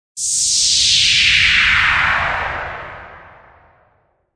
Whoosh with Tail, Whooshing sound; Whooshing sound for video editing, movements, logos, flash, or animation; Whooshes

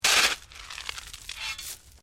Digging Shovel Stones; Various digging tools and processes; Construction; SoundEffects Foundation2

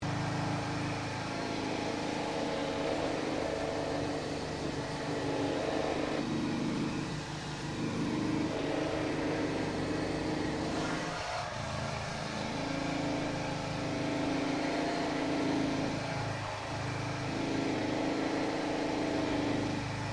MACHINE SMALL GRINDING DISTANT LOOP; Created mechanical noises; Machinery

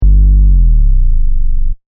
Techno-Trance Sub Bass Loop In G 142 BPM

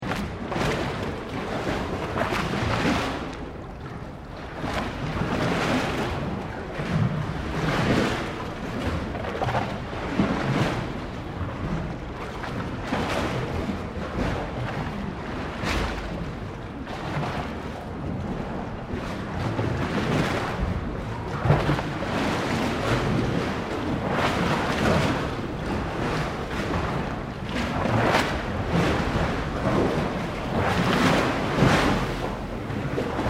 Water Heavy Waves Under Concrete Wharf Loop; Elemental weather effects; Ocean; Nature

